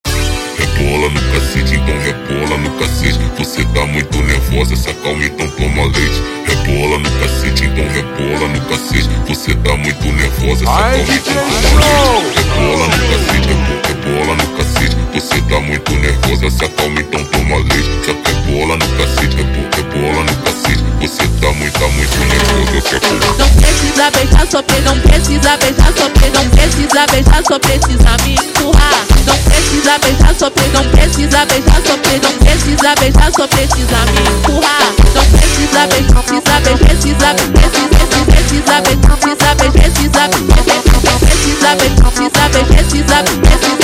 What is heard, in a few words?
super Slowed, funk